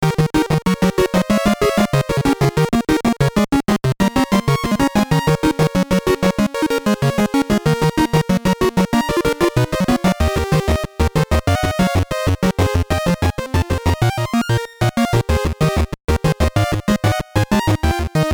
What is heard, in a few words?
BGM, WonderSwan Color; Tonpuusou